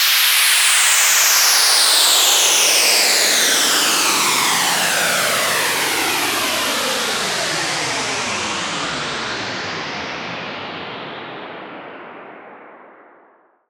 SFX Down, Dubstep; Ghosthack; Dubstep Sound Arsenal

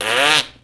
Raspberry; Vintage Cartoon; Comedy Vocals

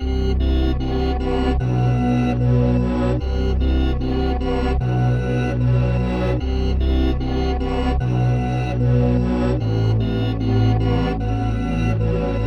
150 BPM E Min, Melody, Reverse; Cymatics; 2022 Melody Collection

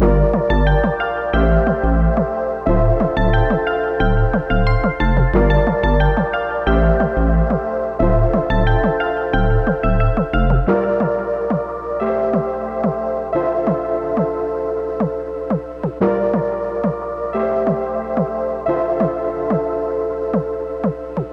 90 BPM D Min (ARP+JU60+P5), Synth; Good Life; Cymatics - Fantasy Synth Sample Pack

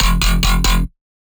Bass Shot F Hydraulic, Dubstep; Ghosthack; Advent Calendar 2018

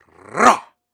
Grunts and Chants, Viking Vocal

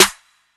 Snare, Drill Drum Kit; Drum One Shots; Mandem; Cymatics; PARADOX II

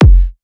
Kick, Techno, One-Shots, Drums

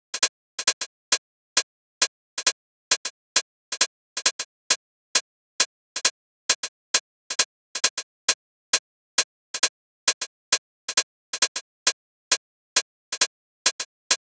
Drum Loop, 134 BPM Hihat, Garage; Loop Stems; Cymatics; GHOST (Beta Pack); Drain Drum Loop

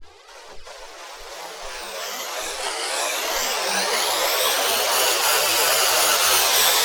Murkiness Riser FX, Dubstep; Ghosthack - AC2024 - Day 13 - Dubstep Sounds 2025; Effects

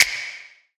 Percussion; Cymatics; Light Free Sample Pack; Drum One Shots

